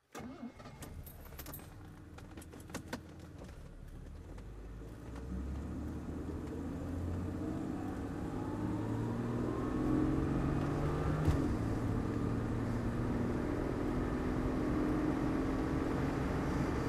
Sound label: jaguar xj6 coupe drive